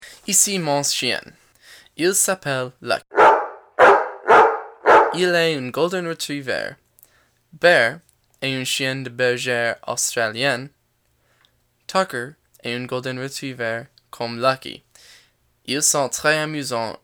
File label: Dogs